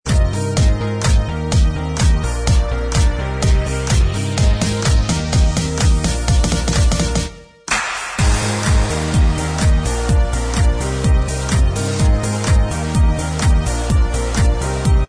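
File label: instrumental, backing tracks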